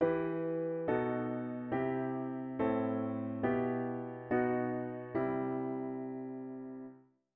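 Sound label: diatonic inverted V7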